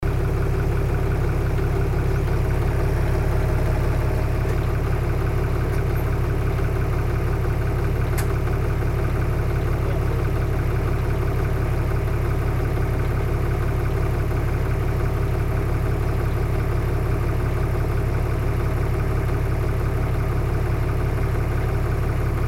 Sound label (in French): Moteur 4x4